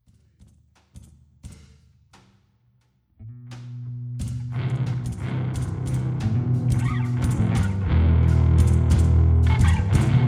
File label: Phase Funky